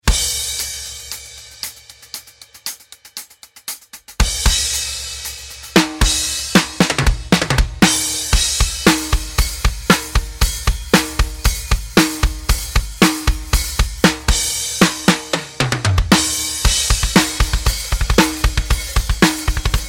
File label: drums